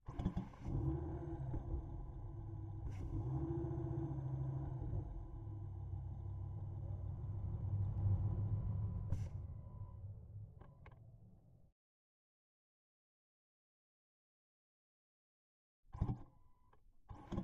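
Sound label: Dodge Viper GTS Onboard Medium Drive Various Engine Intake; 2014; DPA4062; Pole Position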